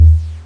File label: Syn Dr, Overloaded Synthetic Drums; style kits